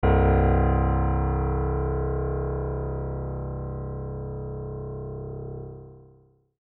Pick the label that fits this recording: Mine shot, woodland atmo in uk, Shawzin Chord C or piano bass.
piano bass